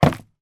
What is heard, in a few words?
food bowl plastic of candy down on hard surface; zapsplat